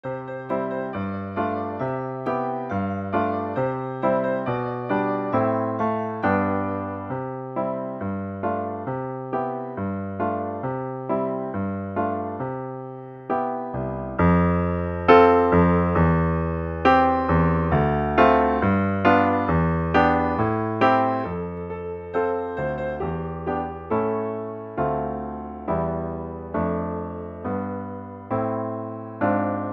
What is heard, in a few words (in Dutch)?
pianobegeleiding